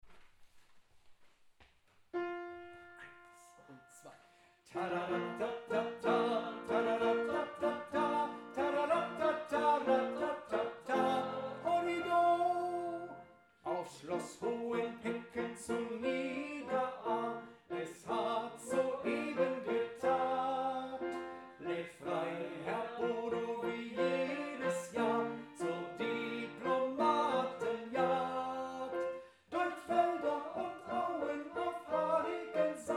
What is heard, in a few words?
tenor